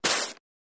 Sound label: Paper Puncture; paper mvmt